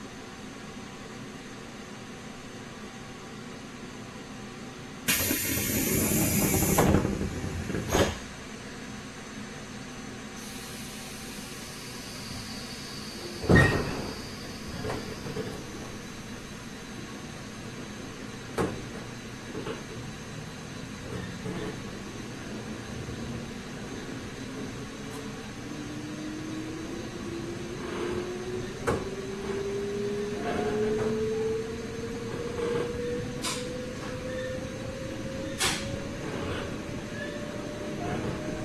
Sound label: special train 189